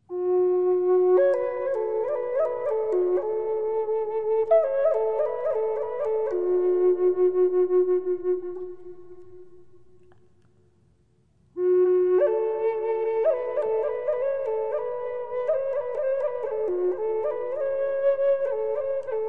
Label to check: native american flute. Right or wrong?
Right